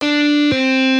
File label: Hammer ons, guitar